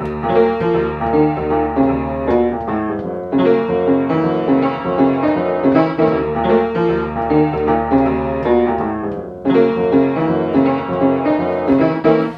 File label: piano rolling